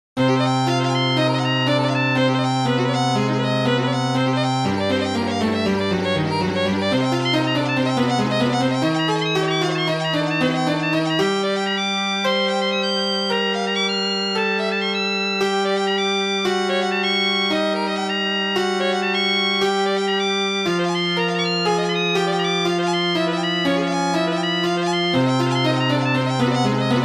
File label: String trio harmonic minor and septimal minor with honky tonk piano